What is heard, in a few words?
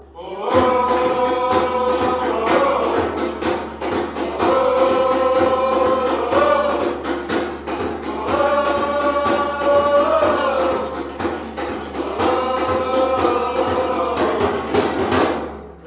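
fanchants, football chants; dale sporting goal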